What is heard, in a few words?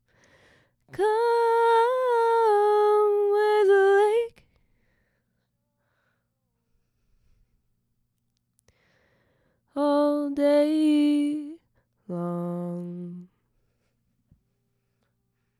vox harmony